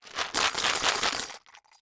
Foley Rocks Plastic Container Metal Clamps Plastic Container Holes Rattles